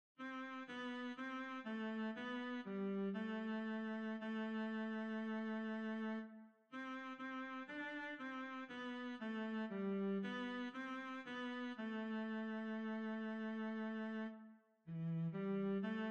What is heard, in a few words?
Variations No Cello; Dies Iras